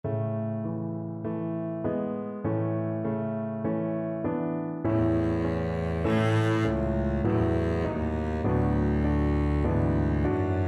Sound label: ave, double bass